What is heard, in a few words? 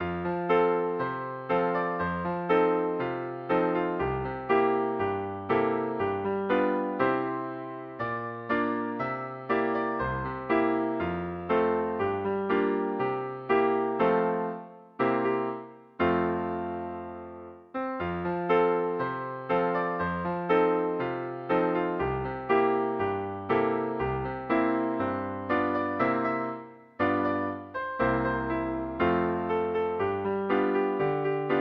melody